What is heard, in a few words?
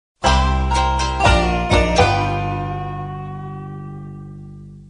sms